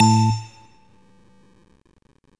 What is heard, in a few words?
Train